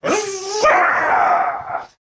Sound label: Roar Peaking A Bit; Hk